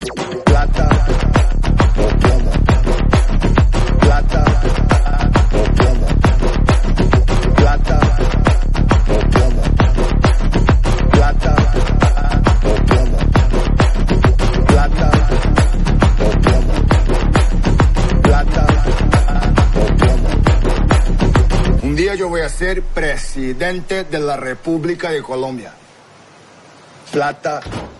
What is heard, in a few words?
dj remix tamil